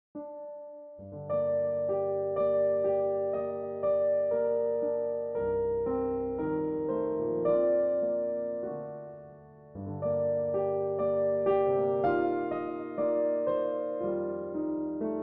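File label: C3 Solo Recording velocity curve, Pianoteq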